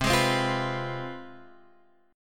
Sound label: C Major 7th Suspended 4th Sharp 5th, down